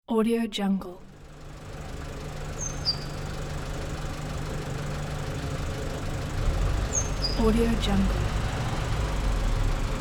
night city ambience

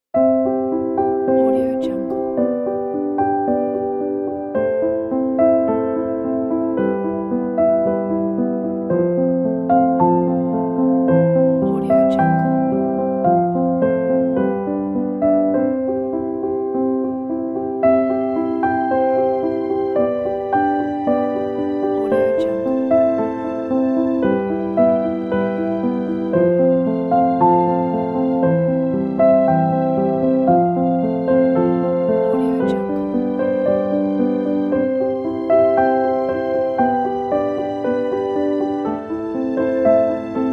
peaceful piano

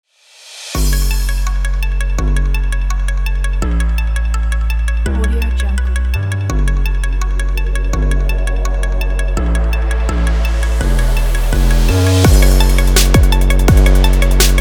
trap hiphop energy

